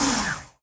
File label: P-51D actuator coolant end, aircraft; DCS